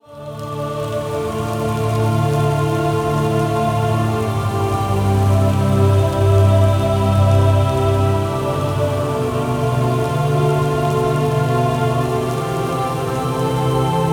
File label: Country Sad Instrumental Nostalgic; 很悲伤想念家乡的歌